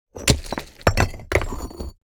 Various bricks dropped on a pile of Stones with debris